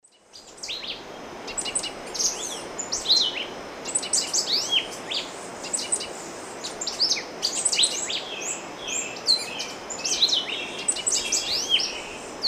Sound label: Euphonia chrysopasta, song; S. America; Eastern Lowlands